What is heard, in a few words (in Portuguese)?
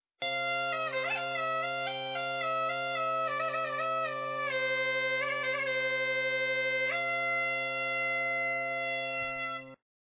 gaita